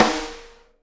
SNARE RIM, Live Snares

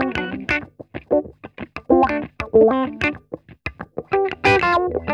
ROLLER, Funk Guitar; Zero G - Funk Guitar

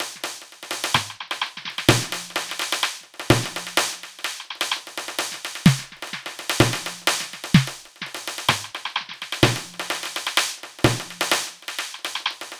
Snare, Snare Patterns; Beat Components

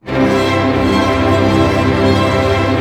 ARP C, STR ARP MAJ; Sound On Sound - Peter Siedleczek's Orchestra

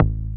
DR. LOOP, BASS; X-Static Goldmine 3

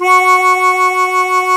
HARMONICA

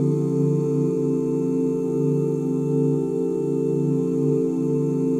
OOH D SUS13, OOH CHORDS, Vocal, Jazz; ILIO; Vocal Planet; Jazz & FX

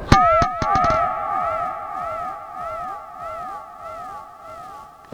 SPACEY L, Funk Guitar; Zero G - Funk Guitar

